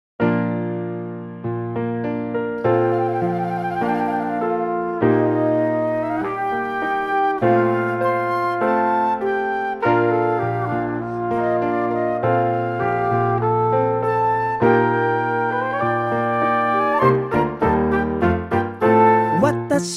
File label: Taiko